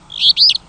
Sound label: fee bee o, Empidonax alnorum; Passeriformes; Tyrannidae